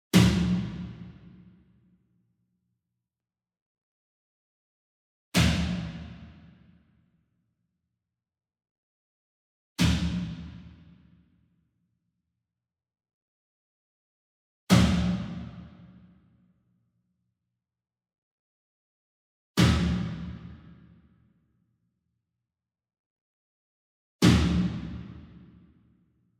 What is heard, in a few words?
SINGLE DRUM Tom Concert Hit Whip Beater Far; boom library; Cinematic Strikes Construction Kit